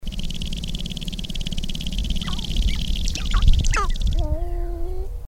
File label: Sharp-tailed Grouse Display